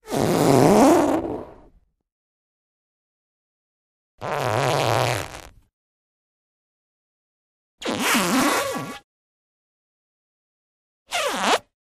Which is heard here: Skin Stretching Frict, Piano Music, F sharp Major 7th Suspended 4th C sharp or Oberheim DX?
Skin Stretching Frict